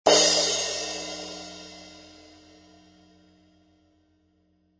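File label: crash, ZB16C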